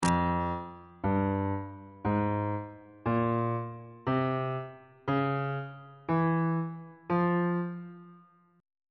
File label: f harmonic minor scale note positions on bass clef